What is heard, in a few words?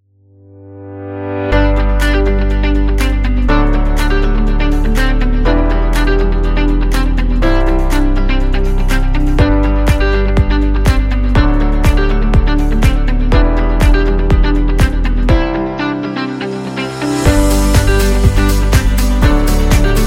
Happy Upbeat; corporate music vol 22